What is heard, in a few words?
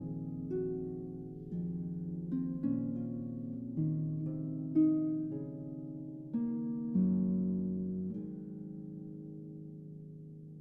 Harp Concerto Lullaby